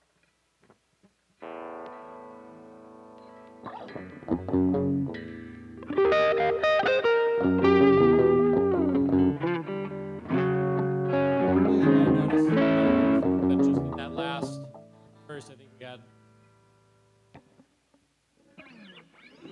Strings

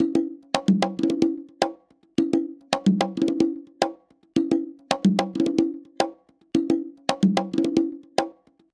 latin conga loop, 110

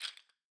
rattles Single Hit Wooden Chill; Slate; SD SEISMIC Drum Sample Pack

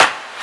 clap one shot; Pop; Drums; Slate; SD PASADENA Pop Sample Pack

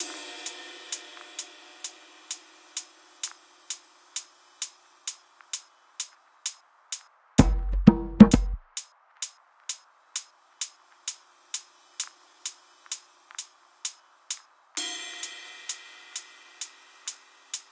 SEISMIC hats 65; Hat Loops; drum sample pack; Iliniza; Slate

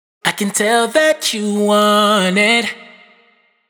hook 86 D#m wet, Vocals; Want It; Slate Digital Omnivox Vocal Sample Pack

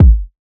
kick west; Drum Sample Pack; One Shots; Slate; SEISMIC

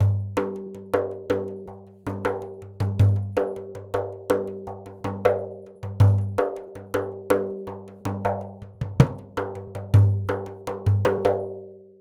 daf loop 80; perc construction loops; SEISMIC Drum Sample Pack; Slate

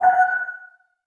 pluck hallow F#; Melodies; One Shots; Slate; AURORA Sample Pack

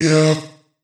Omnivox oneshot Eb, Vocal; One Shots; Slate Digital Omnivox Vocal Sample Pack

